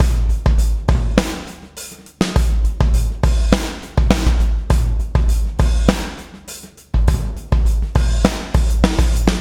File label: BLOW, BLOWUP